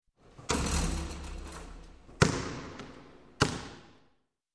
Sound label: Basketball Sink Basket; Sound Ideas 2000 Series